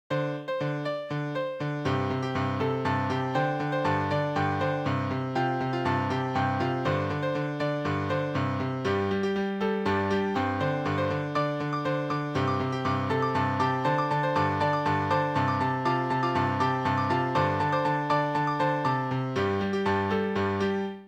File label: piano